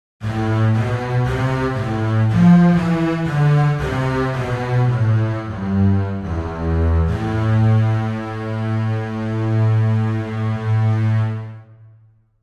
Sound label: Double Basses